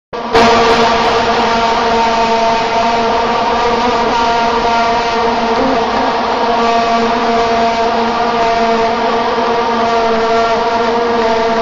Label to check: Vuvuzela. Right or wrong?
Right